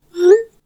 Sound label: Iphone Send; iphone message whoosh